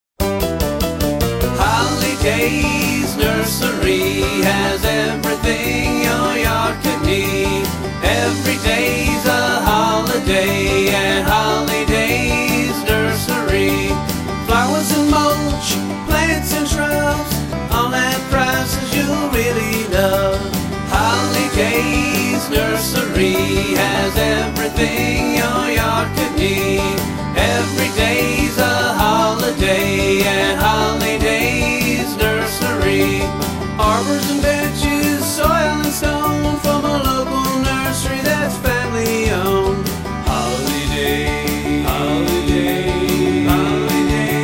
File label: Jingle full sing; Holly Days Nursery